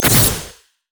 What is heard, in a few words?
lunge; LoL; fiora